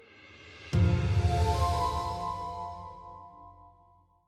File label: Death Jingle; Super Lucky's Tale